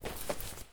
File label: Clothing